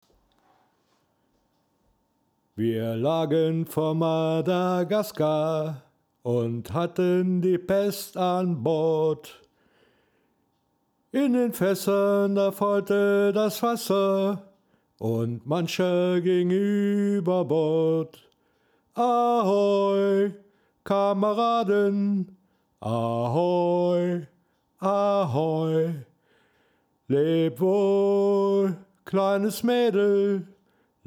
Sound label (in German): Gesang mit, Voice Executor; Audified